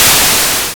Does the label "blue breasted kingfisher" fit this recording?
No